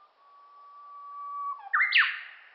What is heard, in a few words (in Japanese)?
uguisu